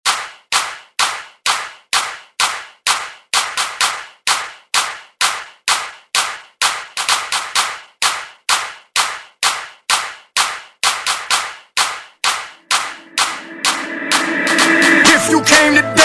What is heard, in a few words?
Latin Dance Starter